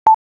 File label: video record start sound; BlackBerry OS-10